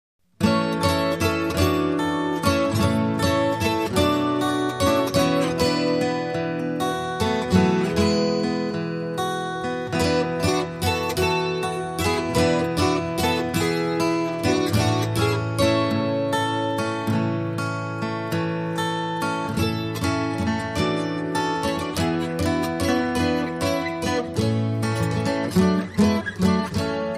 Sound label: Tijuano Ballad, Minus Percussion